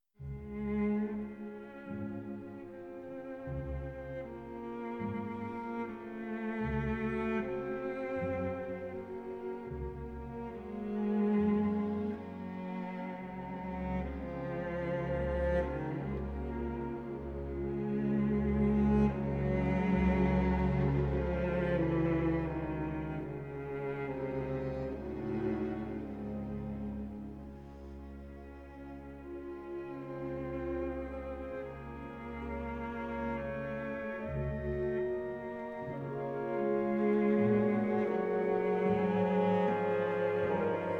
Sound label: Klid for cello and orchestra; Dvorák; B.182; From the Bohemian forest